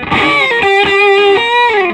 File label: SOLO G, Vintage Blues Guitar